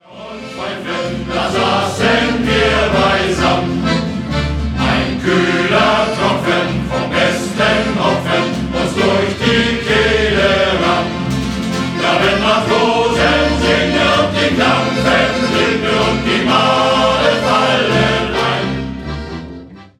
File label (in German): Shanty Chor